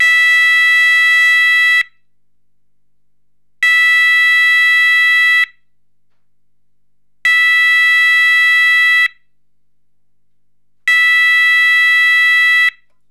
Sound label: Sounder